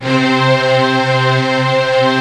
BIG STRINGS; Kid Nepro; Elite

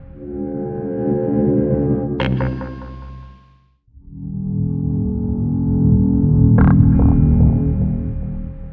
Chase Scene FX; Sonic Foundry (Sony Creative Software) - Stylus Pressure Urban Grooves on Digital Wax

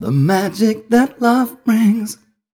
m 128 mel b-flat, Vocals

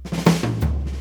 081, Fills; Zero-G; Monster Beats

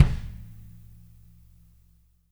AMB KIK, TRASHY KIT; Spectrasonics; Burning Grooves CD2

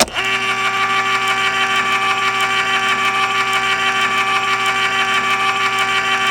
ELECT OPNER, KITCH

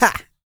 GRUNT, MALE HUMAN; Spectrasonics Vocal Planet

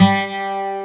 ACOGTR

